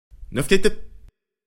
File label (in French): voix